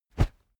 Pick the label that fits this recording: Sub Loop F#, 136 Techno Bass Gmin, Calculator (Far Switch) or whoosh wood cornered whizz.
whoosh wood cornered whizz